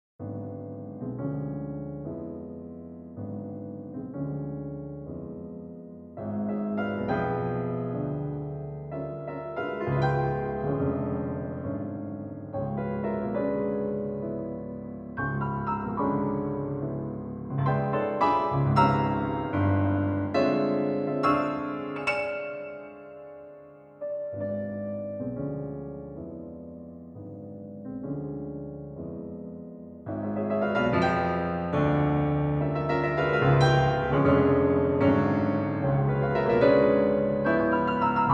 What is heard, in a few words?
Serious variation